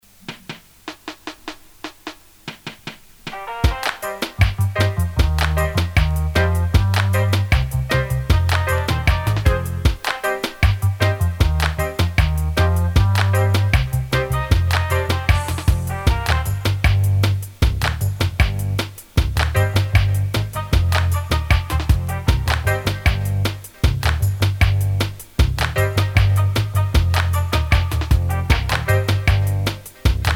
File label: step dub step